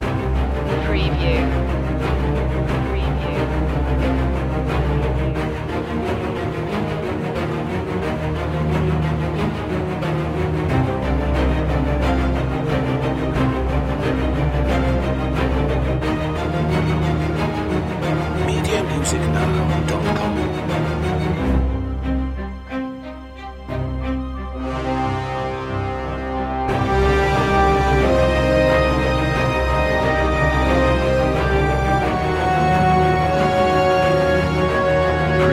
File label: no choir no drums